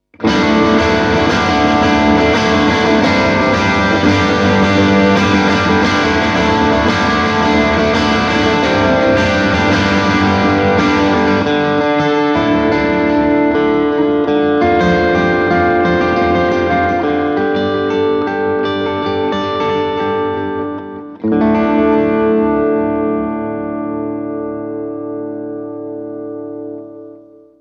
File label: brit combo; cube-80gx